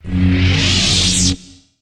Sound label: e blade off; Weapons